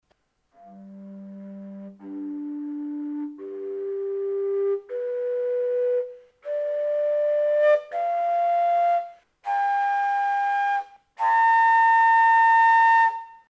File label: overtones